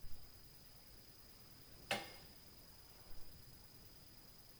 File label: METAL TAC NOISE, Night Noise